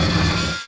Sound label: Scrape, sfx; urbanchaos